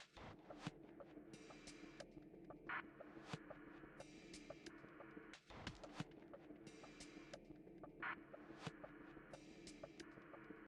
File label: music interactive; World of Tanks